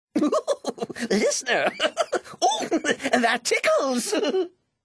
Tickles; Cicero

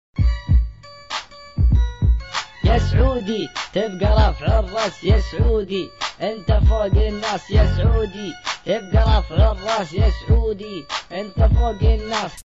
Arabi Rap

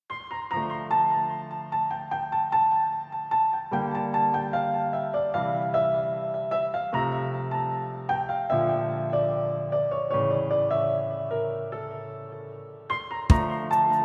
Piano BGM

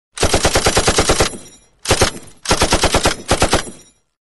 Gun Sound

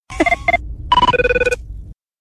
Beep; Palpatine

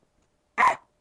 Aaaa

